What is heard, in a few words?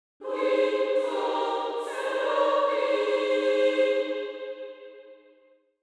Angelic choir angels; wings of seraphim